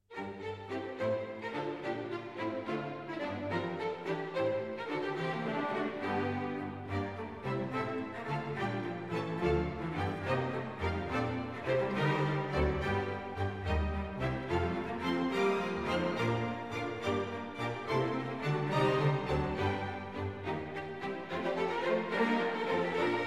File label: Capriol Suite for string orchestra, Tordion; Warlock